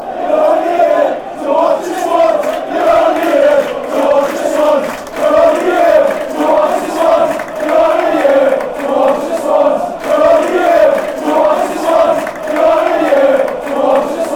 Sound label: only here watch swans, fan chants